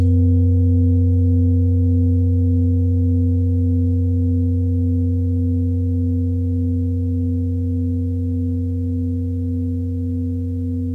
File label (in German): klangschale nepal, Klangschalen Nepal